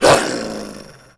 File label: dog mean bark; animals